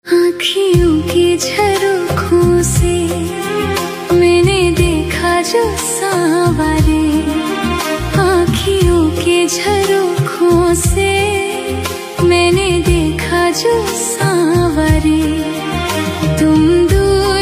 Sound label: AI Female Ringtone; AI Cover